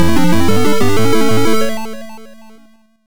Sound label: Level Complete; Turrican 2; Atari ST